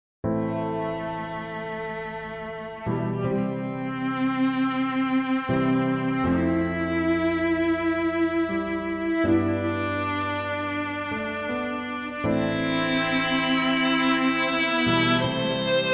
Relaxing Music, violin cello

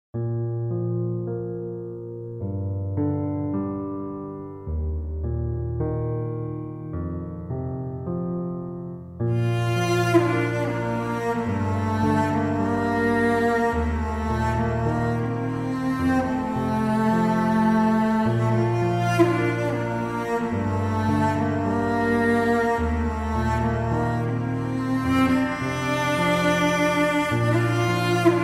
Romantic Violin and Cello Together; Romantic Violin & Cello Together—experience emotional string duets crafted to evoke love

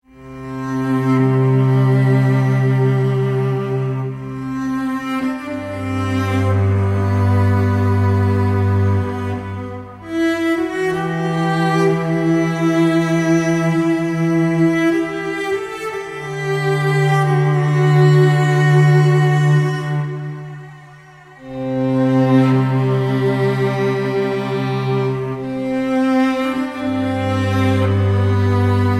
The Calm of the Violin and Cello; Relaxing Music

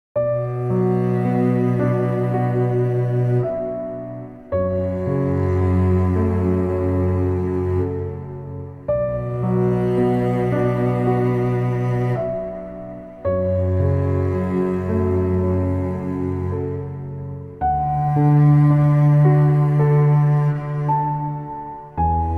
Piano and Cello Duo; violin cello

